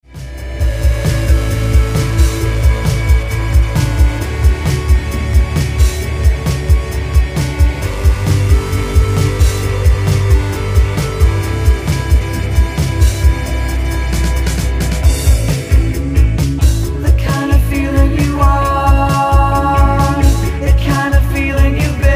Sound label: Trance